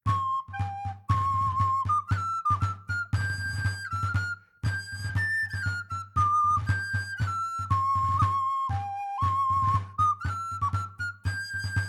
Tavern; Assassin's Creed Unity

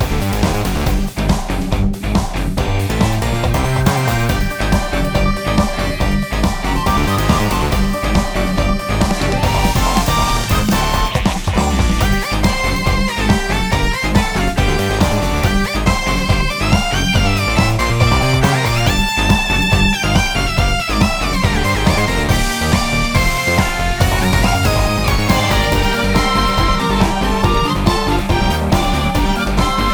Battle end; vivid knight switch windows gamerip 2021; Nest Battle Middle end

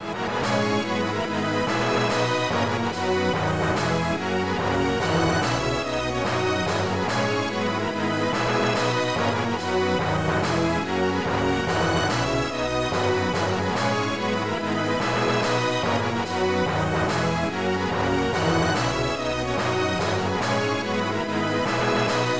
All Clear; dungeons dragons tower of doom; cp system ii arcade

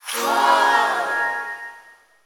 ui create dancer card prompt; Just Dance 2019

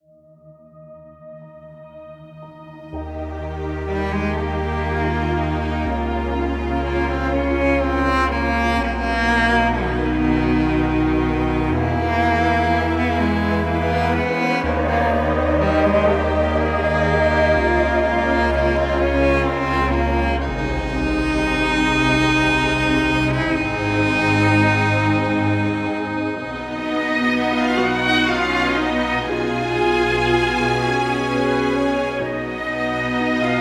123 BPM; DoctorCalls

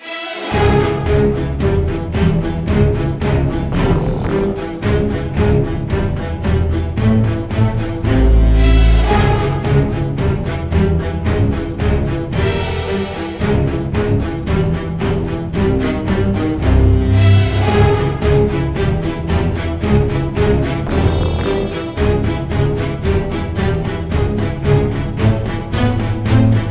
Music; cartoon network punch time explosion xl 2011 gamerip

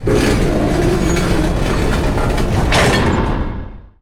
Door Slide

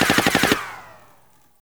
Impact Pow

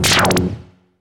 Boss Hit

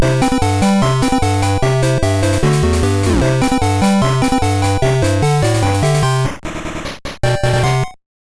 Unknown Jingle; bomberman quest; gb

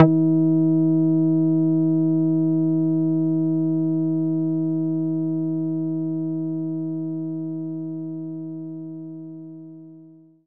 Techno Bass

